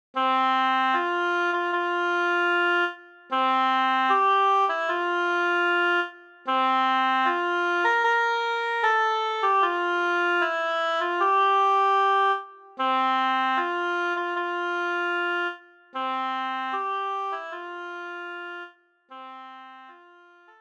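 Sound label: solo oboe; Hochzeitsmarsch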